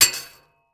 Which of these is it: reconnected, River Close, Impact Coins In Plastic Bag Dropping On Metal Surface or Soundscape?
Impact Coins In Plastic Bag Dropping On Metal Surface